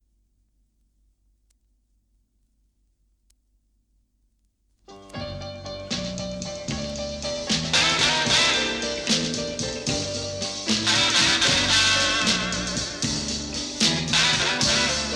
Blues - Instrumental

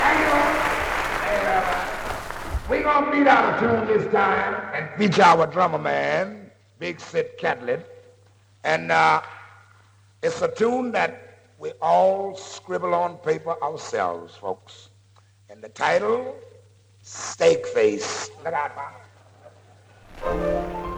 Live At Symphony Hall, With Applause

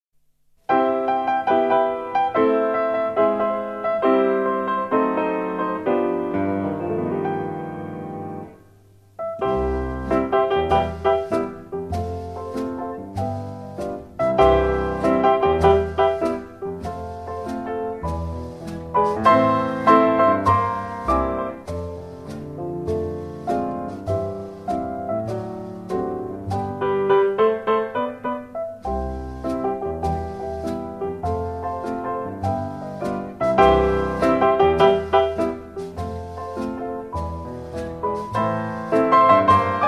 Piano Medley

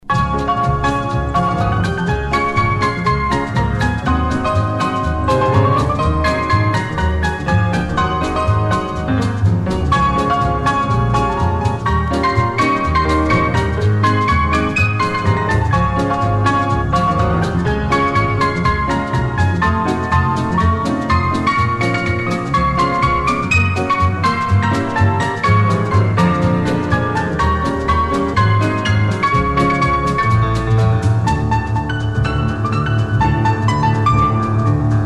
Piano Party

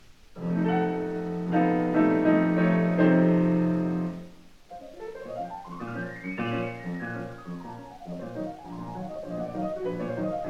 Prelude in B-Flat Minor

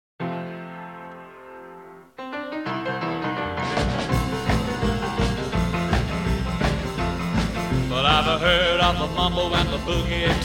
Pumping Piano Rock

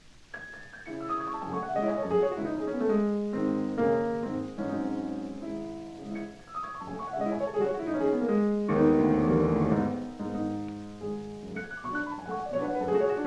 Prelude in C-Sharp Minor

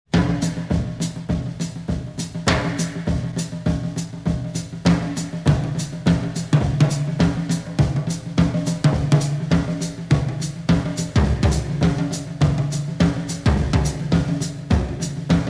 Drums

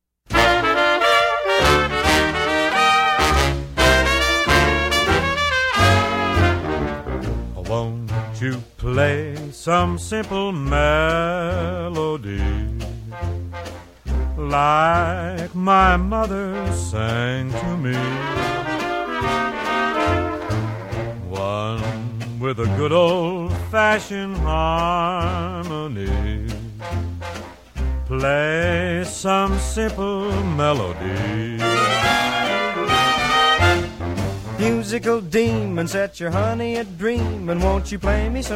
Simple Melody

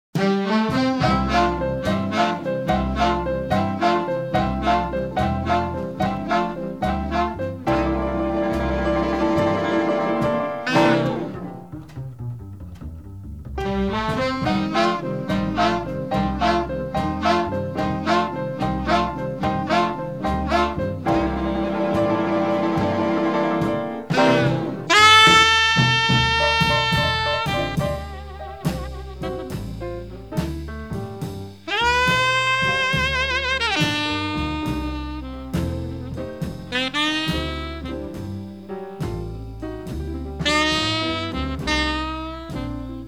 Funky Blues

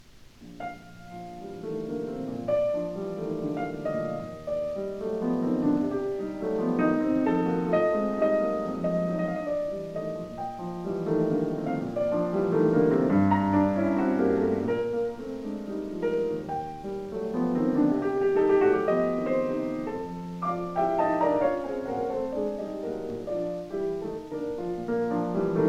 Prelude in B-Flat Major

